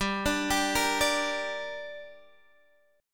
Perebor Gm

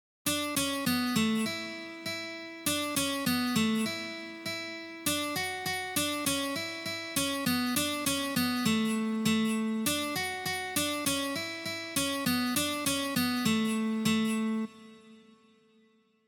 na gitare; dva veselyh gusya

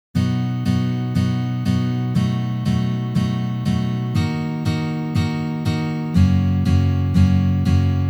Uprazhneniya dlya igry akkorda B7

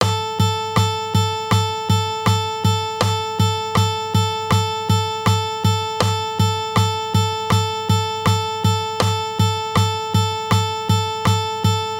Uprazhnenie pod metronom udara

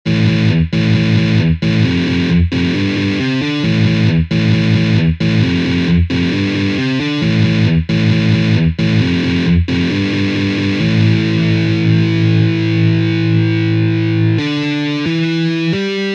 boj na gitare

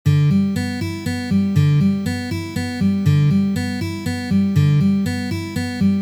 Trenirovka na gitare

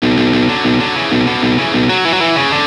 Glushenie struny pravoj rukoj Palm Mute

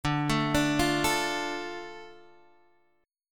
Akkord Dm lad perebor

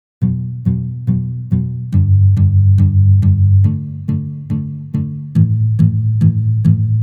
Palm Myuting Palm Muting

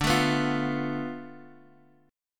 Akkord Dm6 boj

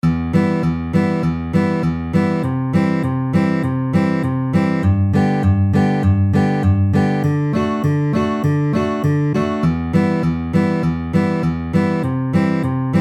Boj Dvojka s basom 100 bpm

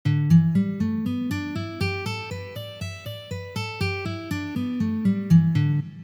Mazhornaya pentatonika

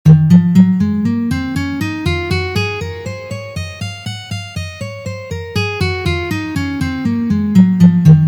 Gamma Do Diez mazhor 1 pozitsiya

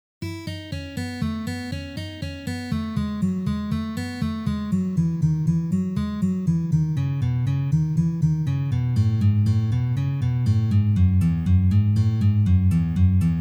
Uprazhnenie dlya igry gammy Mi mazhor

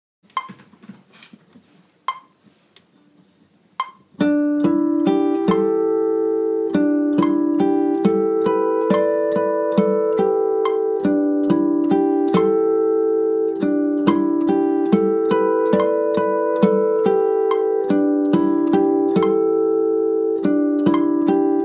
Naturalnye flazholety; uprazhneniya

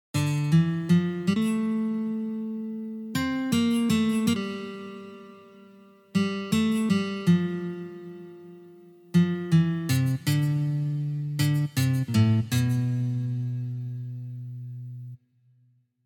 Uprazhnenie slajd na gitare

